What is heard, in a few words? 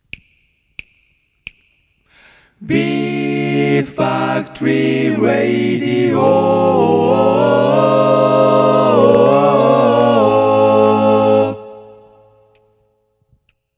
Radio Station Jingle; Beat Factory Radio Station Jingle A-type -2023 Mix- (Mono)